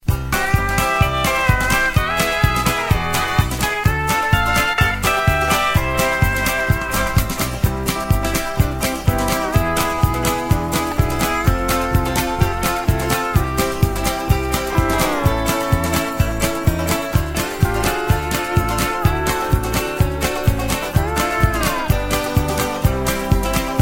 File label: music